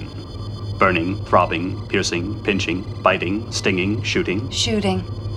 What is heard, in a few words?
pain